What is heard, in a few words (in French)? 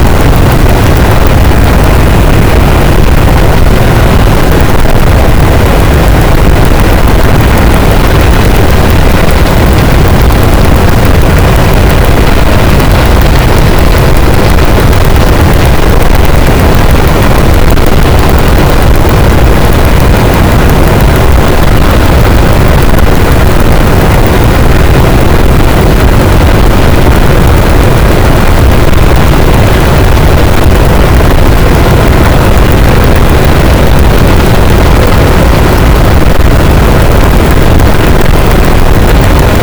cachalot, gaussian noise; Physeter Macrocephalus